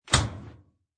Clothes washer is opened; Laundry; Sound Effects:Household

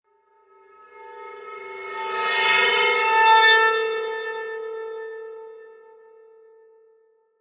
Reverse Feedback Low Pitch; Scifi Production Elements, Set 1; Lynne Music

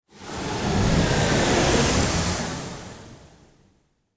Sci Fi Spaceship; Spaceship Whoosh Swirl; Spacecraft

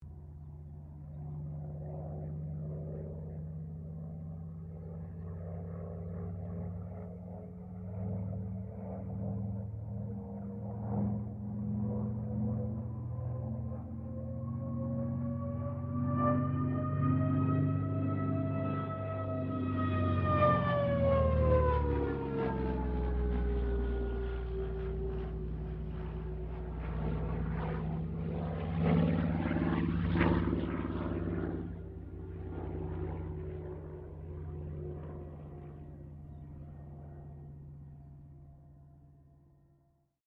AIRCRAFT CA-13 BOOMERANG PASS DISTANT; Airplanes

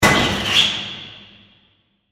Hit To Metal Scrape; Production Element, Imaging Element, Accent, Transition

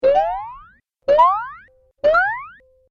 Cartoon Hit And Jump Sounds; Cartoon Sound Design Sound Effects

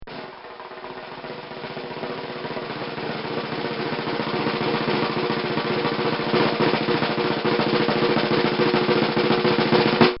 Long Military Drum Roll; Drums (Sound Effects:Musical)

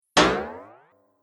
Arcade Game Boing Jump; Video Game Sound Effects; Retro Inspired; Nintendo, Atari, C64

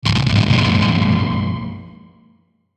Feedback Crunchy Reverb; Feedback Sound Effects

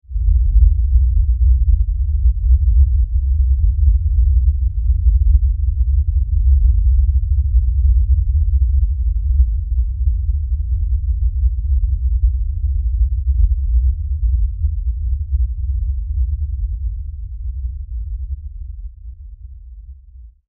Lfe Hit Long Rumble; Production Element

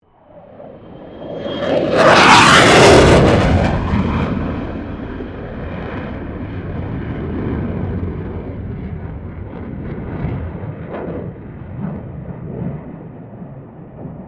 AIRCRAFT FA-18 HORNET PASS; RAAF FA-18 Hornet; Airplanes

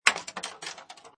Pinball Sound Clank With Ball Travel; Video Games

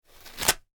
toilet paper rip; Toilet Paper Ripping / Tearing From Roll; foley; Objects; McKinney Sound

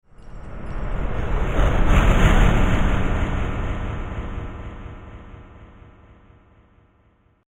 Chamber Of Storms, scary soundscape

